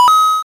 Coin

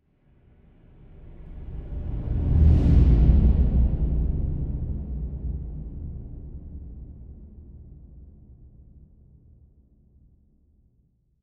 Low Swoosh

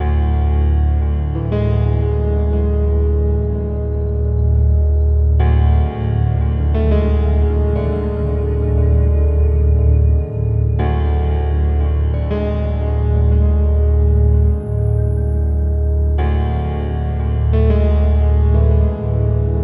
Arc Of Suspense

